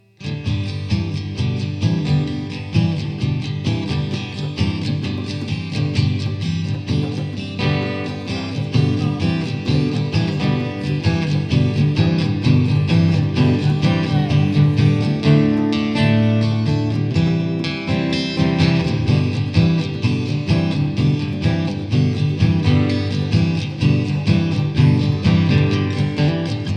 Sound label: Squeeze Box